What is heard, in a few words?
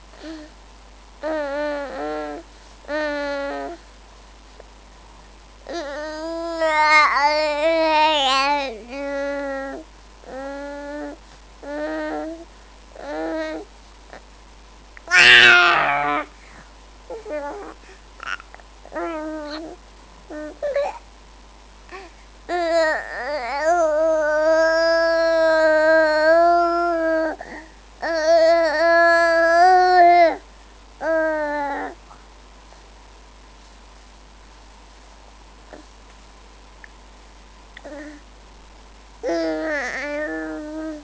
vocalise